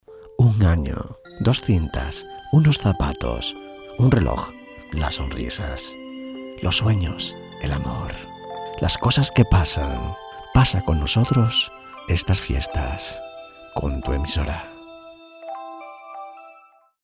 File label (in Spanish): jingle navidad; promo navideño